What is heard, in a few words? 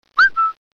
Short whistle; meloboom